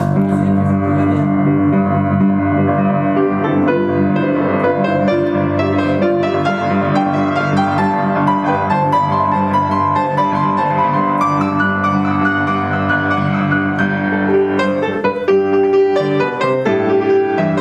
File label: Impro piano; Carmen